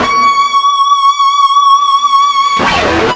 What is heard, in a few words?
FUZZ C#5, ULTRA FUZZ, Guitar; East West; Steve Stevens Guitar Samples Collection